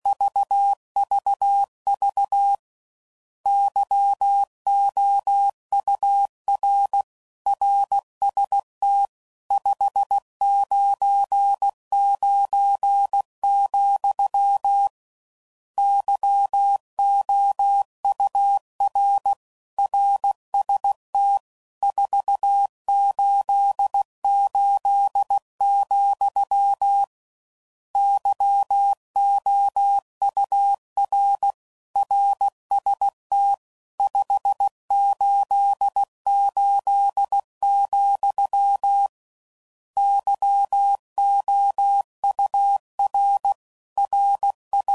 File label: RSTs, CW Code Groups 15WPM; HamRadio; Morse Code